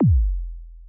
kick, Roland MC-909

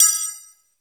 KNIFE, TECHNO; Crypton - DrumBase5000; TECHNO #2

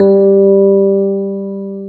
JV SENSITIVE, JV-1080, PIANO; Syntec; Wall of Sounds

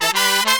100 AM L, BRASS SALSA

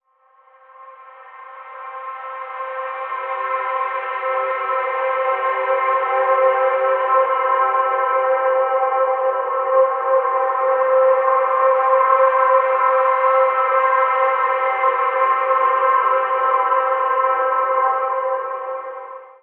Silken Sheen; Zero-G Ambiosis; Light

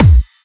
Clubby Kicks, Bassdrums

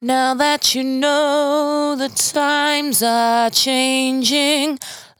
92bpm Bb, Velvet Vocal; Zero-G

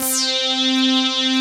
303 stab; Pro-Rec - Synthsonic

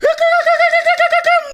VOCAL LINES; Best Service; World Colours

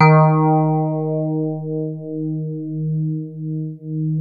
DX7 hard D#3, e-piano; Music Store

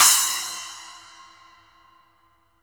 SPLASH A, SPLASH; Sampleheads; New York City Drumworks VOL-1

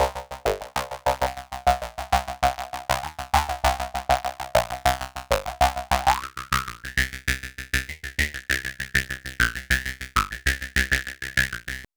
XPANDER, SYNTHS

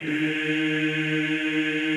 EEH D#2, Extended Classical Choir; Best Service

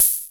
Univox Micro-Rhythmer 12, Drums

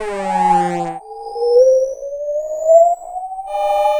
Sci Fi FX; Sonic Foundry (Sony Creative Software); Stylus Pressure Urban Grooves on Digital Wax; Record Stopper

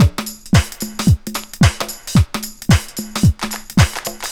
LOOP, Drum Loops; Big Fish Audio; Prosonus 500 Drum Loops

